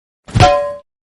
pan sound notification; free fire

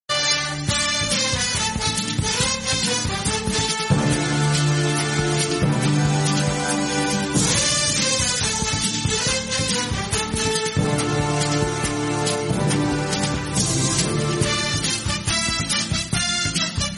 bgm background theme music

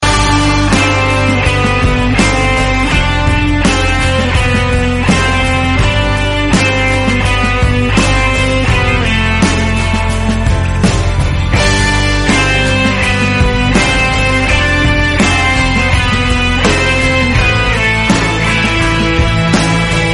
guitars

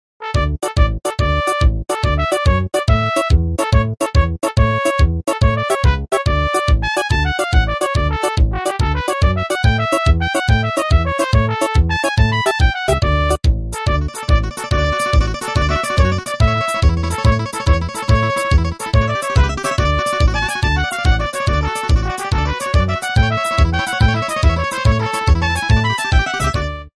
hat dance nokia 3120

